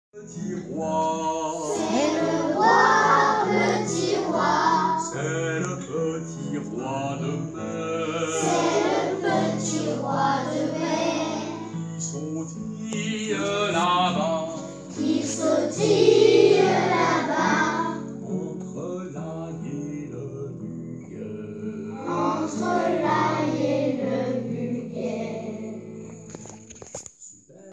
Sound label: Vocal